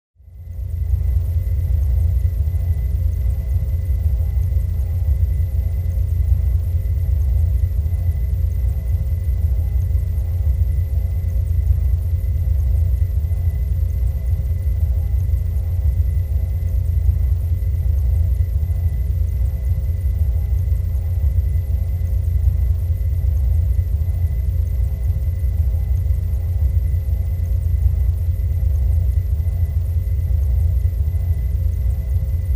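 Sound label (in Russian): внутри космического корабля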